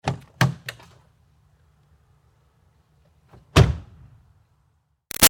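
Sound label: Car Highlander Door Open-Close Med Far; 2005 Toyota Highlander V6; Cars; Vehicles; Big Room Sound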